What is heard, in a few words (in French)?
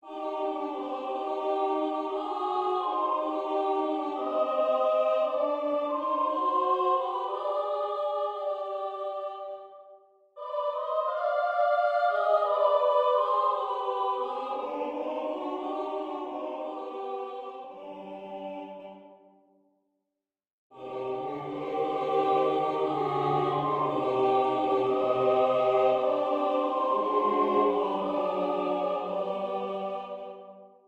les cloches